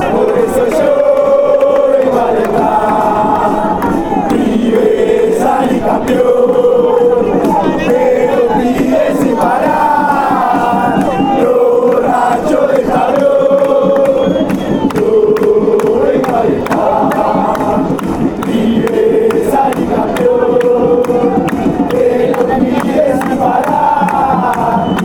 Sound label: i come to cheer fanchants